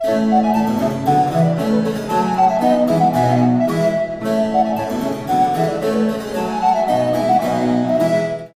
Gavott

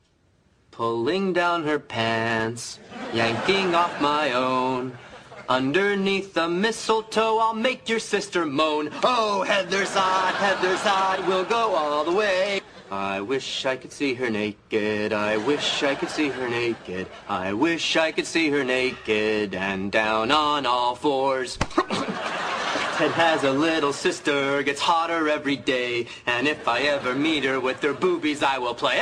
singing

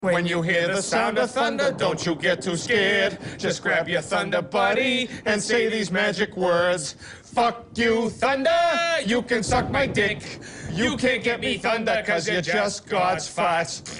thunder song; ringtones

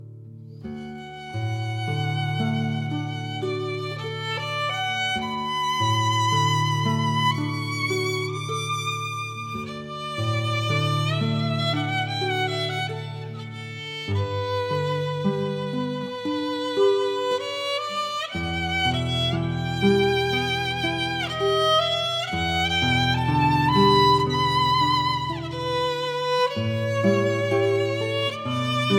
violin viola

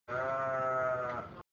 sheep baa; animals

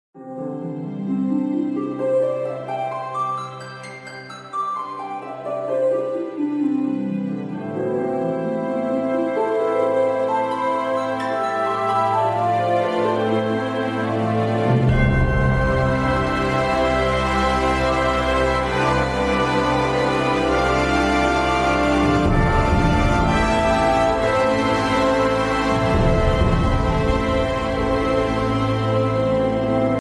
Login Screen Music

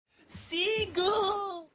seagull; scrubs

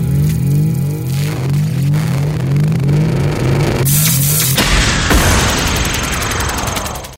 Pulse

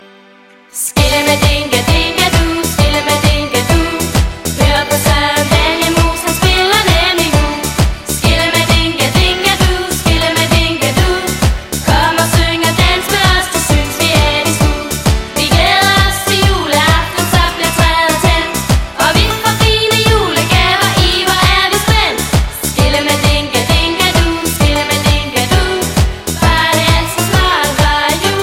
banjo mice; ringtones

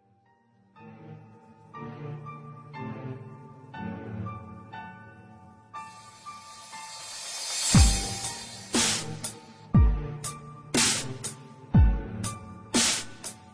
filthy dubstep

